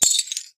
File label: glass falling